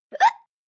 Hiccup Classic, Hiccup, 1 child, classic, human voice, kid; Hiccupping